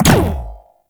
Alt Fire Projectile Bounce, Weapons; Warframe; Corpus; Tenet Quanta

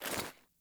Foley Run Default, Default Clothing

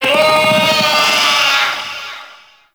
Feral Vocal Spawn D; Warframe; Catbrow

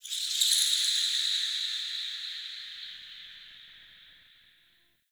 Under City High Creature Echo; Ambience; Dungeons

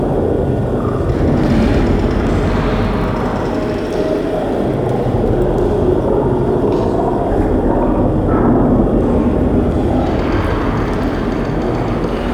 Forest Interior Water Tunnel; Warframe; Grineer Forest

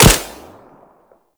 Grineer Shield Speeder Rifle Fire; Weapons

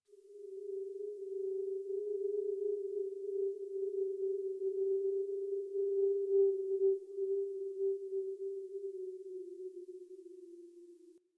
Venus Landscape Distant Corpus Texture; Ambience; Warframe; Venus Open World

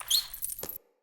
Rat Jump; Cinematics; Bromius; Bromius Quest Verminia Floppet Merge

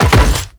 Land Metal, Footsteps; Warframe; The New War; Kahl

